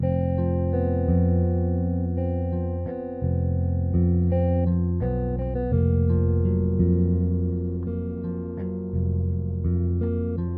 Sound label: Shelter Dialog Confrontation